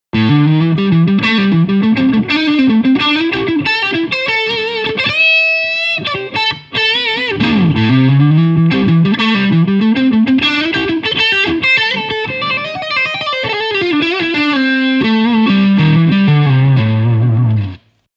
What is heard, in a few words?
Crunch Lead